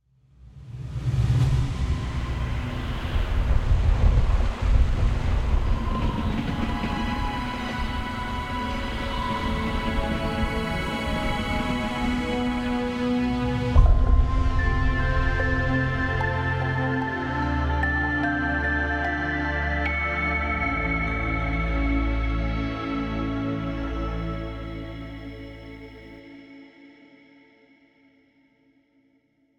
Rising Bridge Tower Fall Stinger

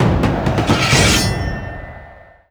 Win; Spartan

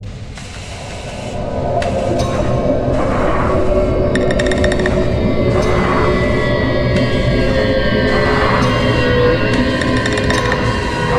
Secret Area Ambient; PositronX

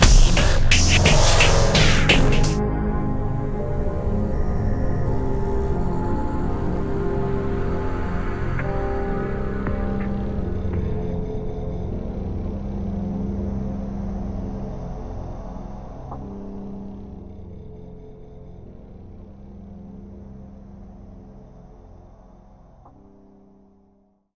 firefight sting; AirMech Command VR

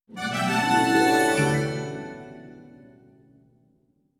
Get item; ChemCaper

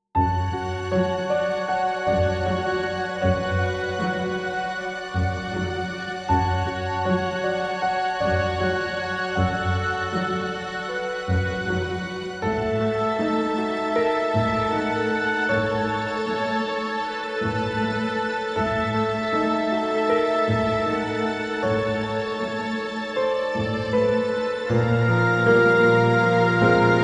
Opening Sequence; witch thief switch windows gamerip 2018

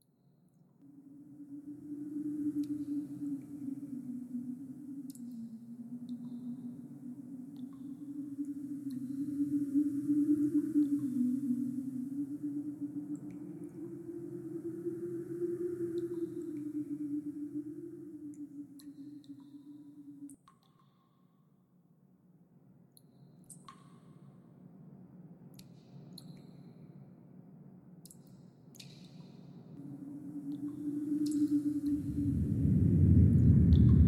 Generic Ambient; Junk Jack

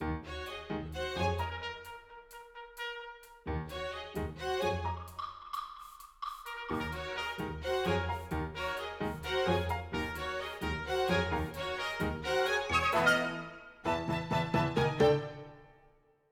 Field Battle; the legend of zelda tears of the kingdom; Ground Easy D For Loop Key2

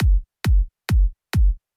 Title Intro 135bpm

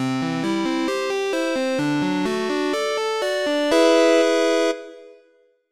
stats jingle; Toast Time Smash Up

